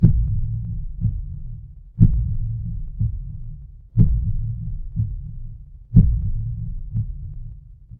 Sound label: horror heartbeat